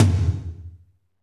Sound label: TOM, Kit Dry; Northstar; Drumscapes Roland